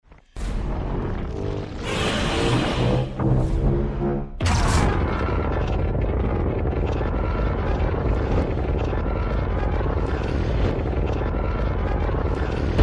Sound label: atomic breath; godzilla 2021